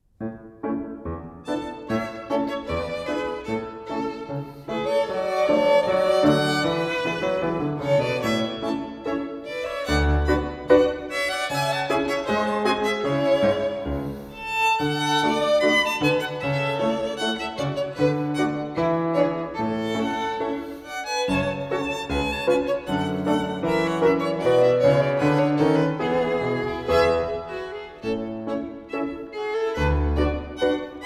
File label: bgm